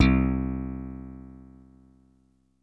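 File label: slap electric; ZERO-G; Datafile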